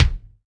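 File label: Kick Double Head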